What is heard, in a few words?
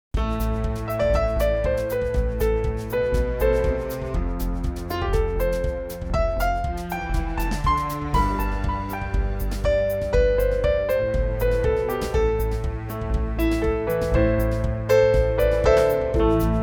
Jazz